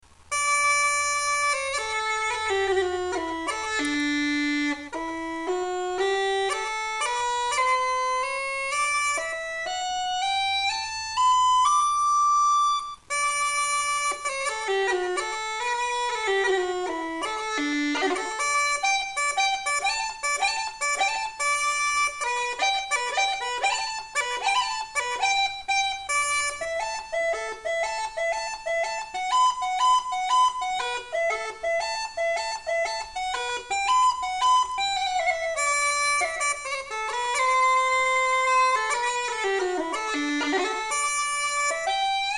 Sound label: maple chanter